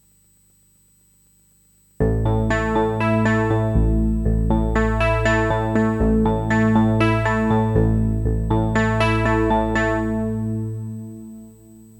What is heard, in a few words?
Bellsound Cordial USB Midi